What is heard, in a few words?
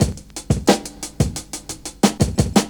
OLD SCHOOL, Breakbeats